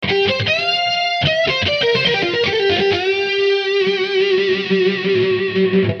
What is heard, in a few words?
C 090 solo